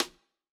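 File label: Snare Stick, drum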